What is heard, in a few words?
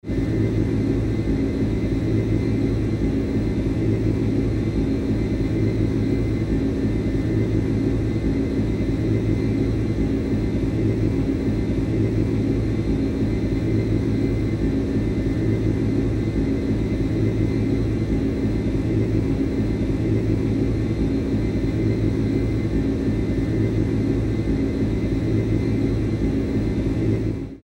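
jet interior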